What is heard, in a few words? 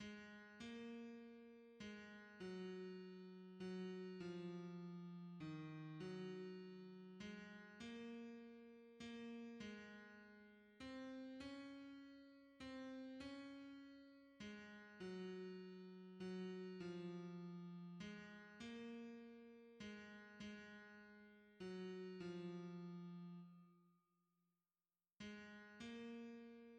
hymns, tenor